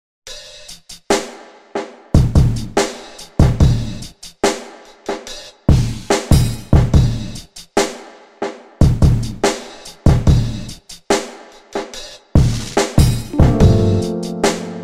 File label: Slow Jam Jazz; Soundzabound Volume 5